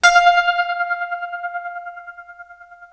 TREM F 4, Guitar, TREMELO; East West; Steve Stevens Guitar Samples Collection

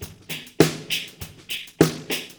disco fx 100, Beat Loops; Zero-G; Monster Beats

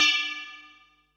HH, Pearl SC-40 Hihats and cymbals

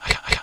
P WS KAAHH, VOX; CMP - Analogon

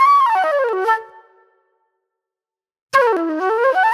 Movie Flute; Sonic Foundry (Sony Creative Software); Manhattan Flutes; Super Brown

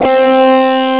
lied guitar; Amiga Soundtracker sample

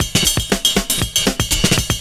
CYMB, Breakbeats; USB Soundscan vol.46; AKAI

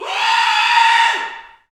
Bullhorn, Afro Horns, Africa

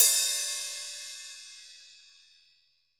HH open; Best Service; Festa Latina VOL-1; Single-Hits

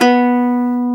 UKULELE B3; AKAI S-Series CD-ROM Sound Library VOL-7

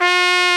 BRS, Trumpet, Cho Doo; Roland L-CDX-03 Disk 2; BRS Tp 2 Cho Doo

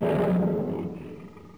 Lion, Yamaha DD-7

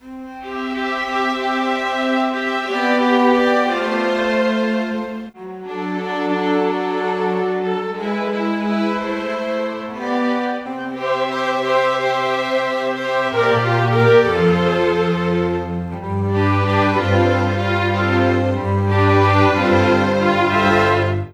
Rock Pop, Orchestral; Sonic Foundry (Sony Creative Software); Orchestral Series VOL-4; Rock and Pop; Rock-Pop 12

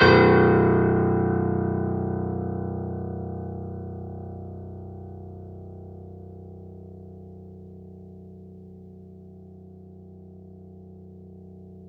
FF B 1, Grand Piano off mic; AKAI S6000 CD-ROM - Volume 2

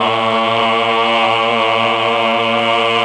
CHOR; ILIO; Propeller Island Legendary M400

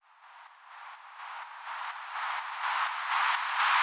Pumping Riser; Electronisounds

